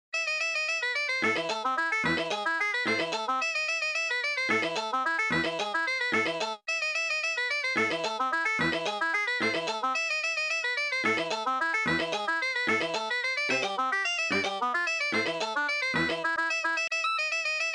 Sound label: Bagpipe